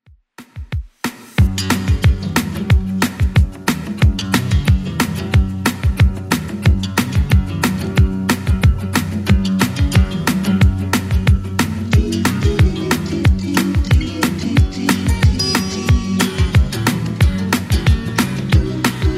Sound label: 60s Redrum 182bpm